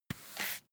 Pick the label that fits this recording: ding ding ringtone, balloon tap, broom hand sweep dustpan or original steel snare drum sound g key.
broom hand sweep dustpan